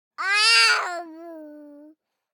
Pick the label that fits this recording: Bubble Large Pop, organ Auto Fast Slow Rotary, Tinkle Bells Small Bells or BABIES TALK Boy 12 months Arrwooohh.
BABIES TALK Boy 12 months Arrwooohh